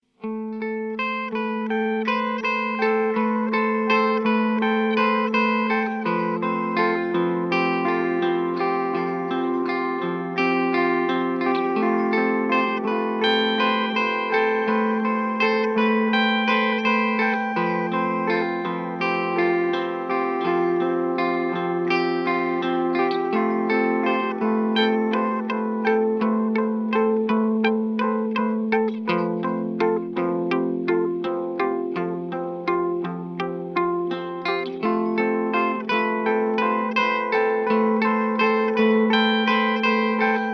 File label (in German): Lange Delays mit schneller Modulation Analog Delay; Chase Bliss Audio Tonal Recall RKM